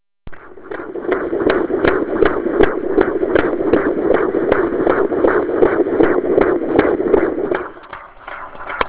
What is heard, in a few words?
baby heartbeat